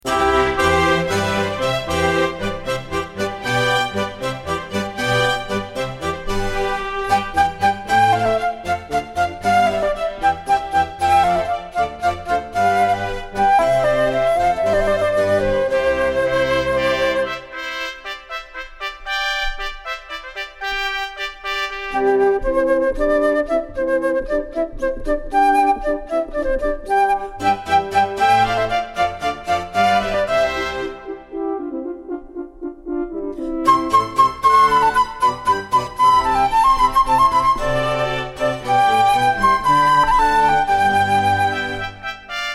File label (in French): AIR DE WATER MUSIC D' HAENDEL